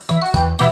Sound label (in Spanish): pajaros; angry birds